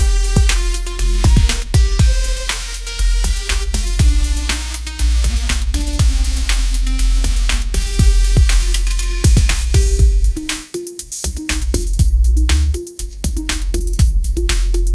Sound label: country pop groove; ai